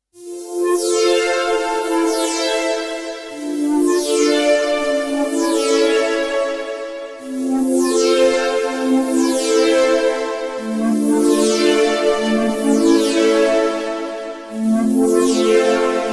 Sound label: Future Synth; Ambient FX